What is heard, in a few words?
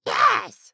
Minion Sword